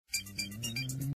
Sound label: Beep; Kim possible